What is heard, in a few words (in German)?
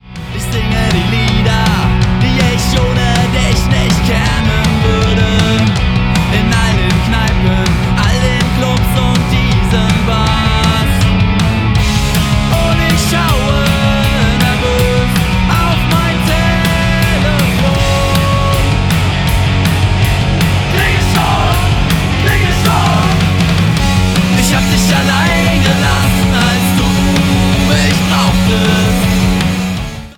Allein gelassen